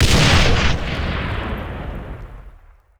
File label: frag explode